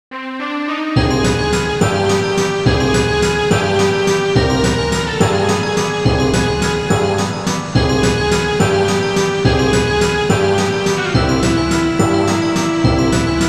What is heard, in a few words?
Dark Waltz; Digimon World